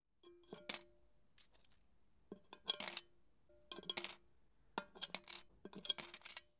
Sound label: Small Coins Dropped Into Metal Piggy Bank